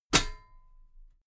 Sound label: metal ladder step heavy